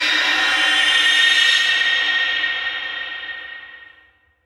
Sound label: VFX Atmos